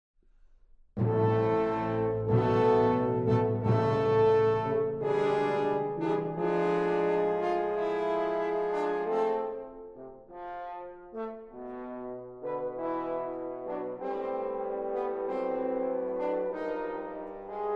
Concerto For Piano And Wind Instruments, Largo Allegro